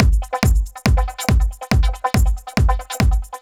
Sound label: Kick Loop, 140bpm